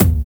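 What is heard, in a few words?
ODD 909 KICK; Drums In Your FACE!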